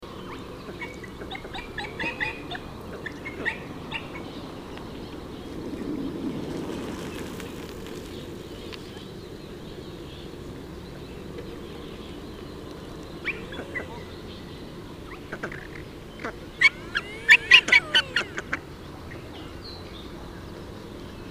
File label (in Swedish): Brunand